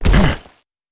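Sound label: damage, monster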